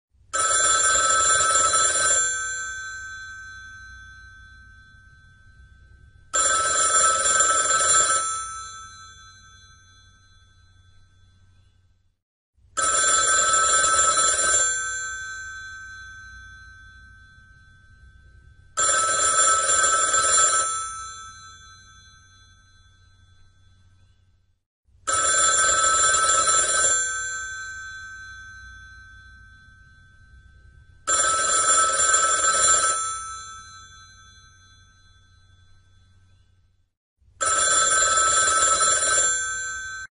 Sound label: Old Telephone Sound